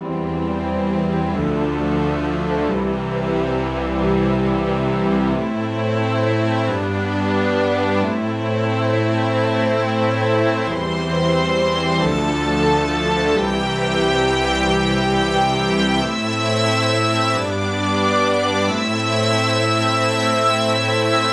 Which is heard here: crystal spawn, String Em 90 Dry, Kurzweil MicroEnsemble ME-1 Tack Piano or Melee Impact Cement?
String Em 90 Dry